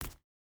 footstep barefoot concrete jump land; Rust

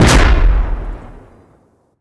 helicopter rocket explosion; Rust

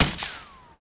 bullet dirt

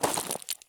stop slate; Escape from Tarkov

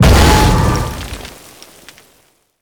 biomech emerge

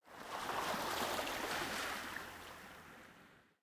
water splash

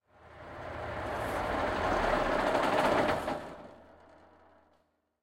t90a engine stop, tanks; War Thunder

